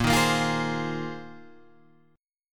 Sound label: A sharp 7th Suspended 2nd Suspended 4th, Guitar, Open G